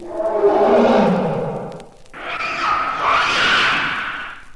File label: monster; Animals